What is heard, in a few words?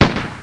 shotgun